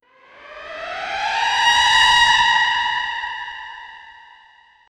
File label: horror film score effect